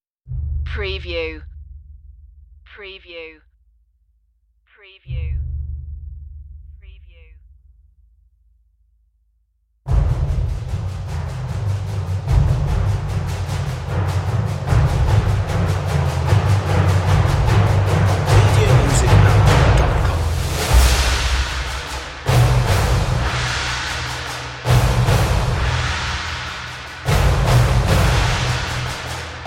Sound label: the kings men perc